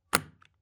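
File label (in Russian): звук щелчка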